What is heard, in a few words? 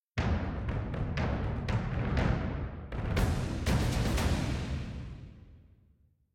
String; Assassin's Creed Unity Dead Kings